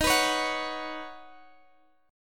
D sharp Minor 7th Sharp 5th D sharp, down, Guitar, Half Step Down